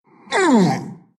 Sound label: pain ru